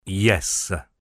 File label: yess